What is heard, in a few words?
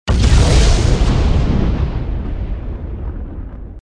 flash; REM-Freelancer; REM-Mod